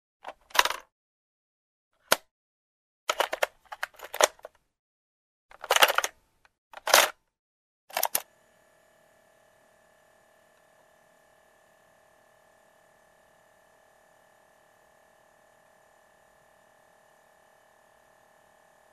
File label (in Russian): kassetnyj magnitofon